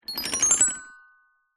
leisure toy musical xylophone keys plastic run glissando